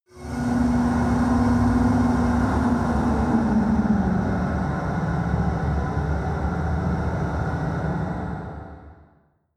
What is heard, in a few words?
machine howl crackle